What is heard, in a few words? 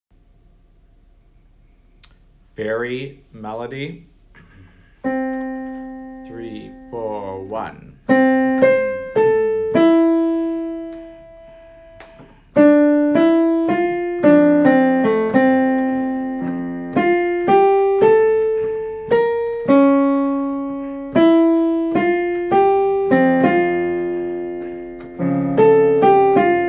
melody